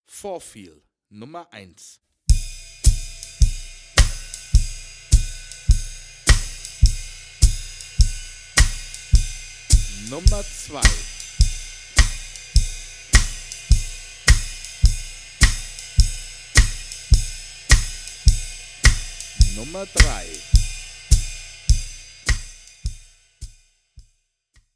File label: 4-Feel; Schwarzes Album; Bum Bum Tschack Jazz Latin